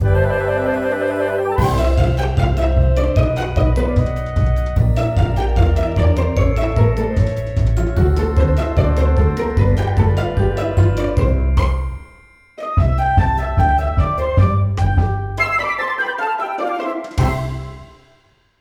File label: music